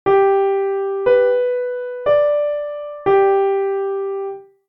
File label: Hard Melody